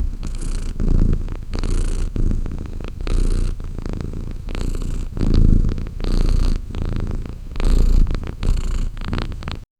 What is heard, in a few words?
animal cat wild puma purr